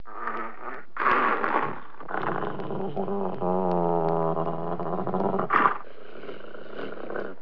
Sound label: dog growl; animals